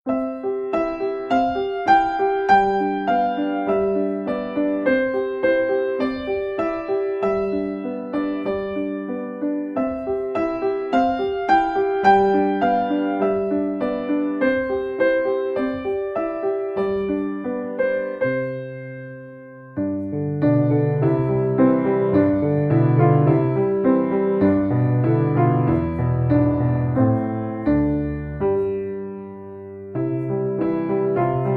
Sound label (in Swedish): Piano